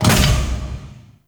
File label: Powerplant Jettison Door Stop; Gameplay; Warframe; Corpus Railjack; Tether Power Plant; Ambience